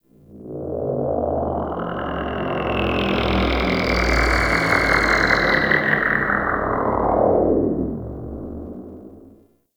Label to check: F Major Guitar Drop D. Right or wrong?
Wrong